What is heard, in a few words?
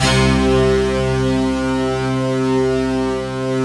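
fast attack fuzz, Fuzzy Stacks; Optical Media International; Sonic Images Library; Fuzztones Stk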